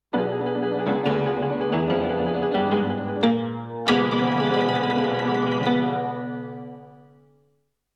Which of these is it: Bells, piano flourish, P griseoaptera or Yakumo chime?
piano flourish